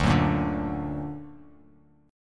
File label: D Augmented D down, Bass Standard; D+ strummed; JGuitar